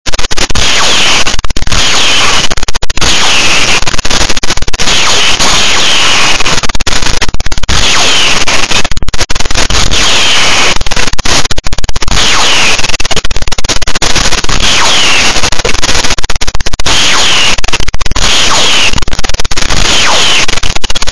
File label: sw lasers; Star wars